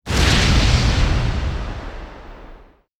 Lantern Flame Burst

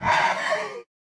Death

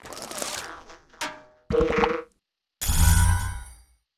Skill Junkfood; starbites

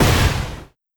Energy Conv Energy Flash; Legend of Keepers

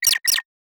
Player Idle

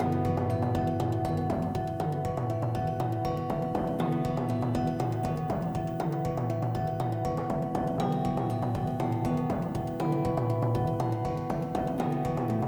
Crystal Bass

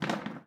Plastic Chair Stand

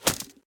Arrow Hit

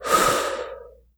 Voice Female Breath Blow; Voice Female A Breath Blow 02

